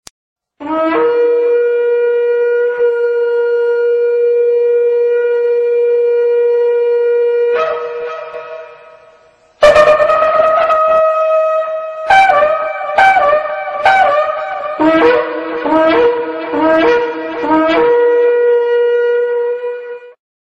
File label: shofar